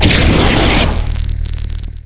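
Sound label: emp trigger; zaero; items; empnuke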